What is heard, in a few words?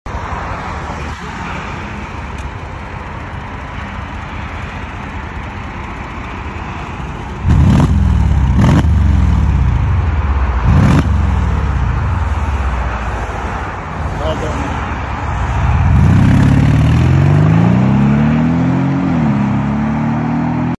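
Benz cls500, exhaust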